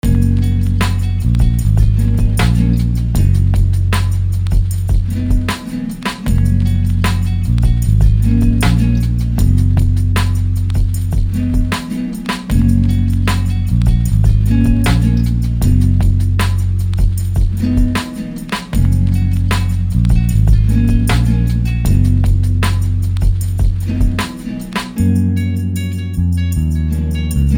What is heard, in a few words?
breaks